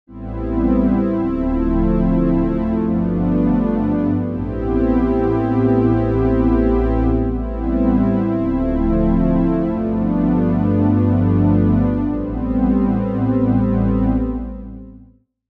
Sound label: jupiter 8 mellow strings; opxpro3